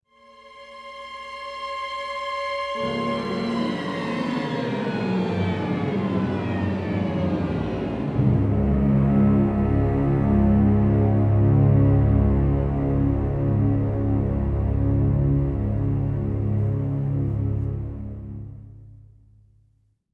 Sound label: STRINGACCENT, Orchestral Loops